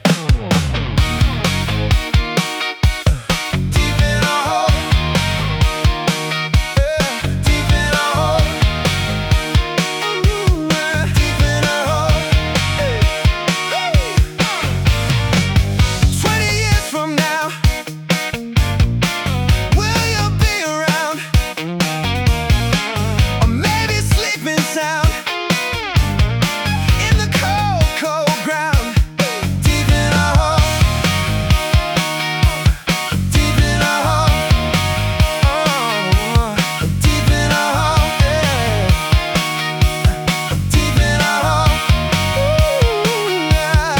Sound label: Indie G Funk; Humain Records